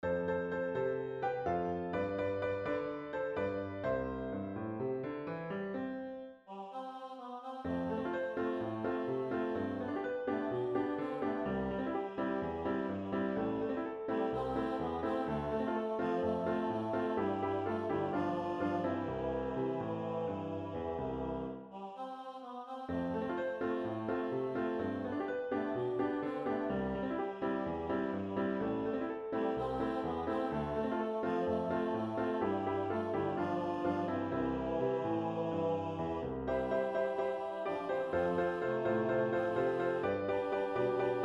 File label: Piano